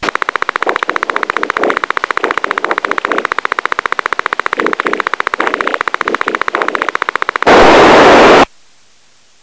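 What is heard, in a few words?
UT-98 Marine Scrambler, radio sounds; Icom UT-98 Marine Radio Voice Scrambler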